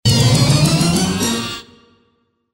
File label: reload